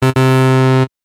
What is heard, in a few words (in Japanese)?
hazure